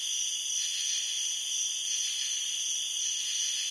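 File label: insects loop; video game; source engine; portal2; ambient; nature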